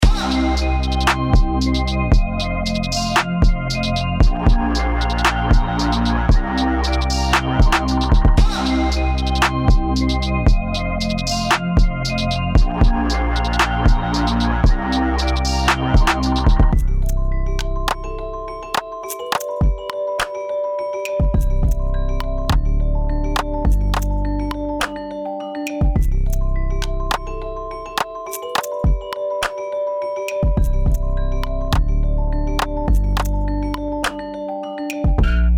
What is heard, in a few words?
knocking drums